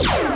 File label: flak; lander